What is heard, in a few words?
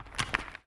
Rubble